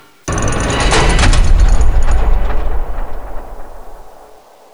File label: door lock